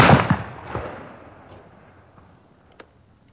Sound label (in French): FUSIL; East West - Global Sounds